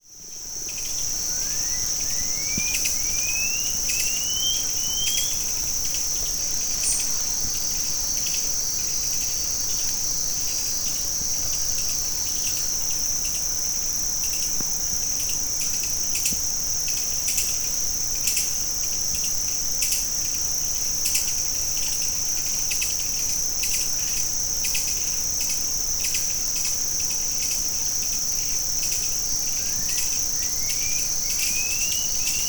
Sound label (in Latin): Pipile grayi, Pava Goliblanca (Pipile grayi)